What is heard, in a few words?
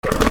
WEAPONS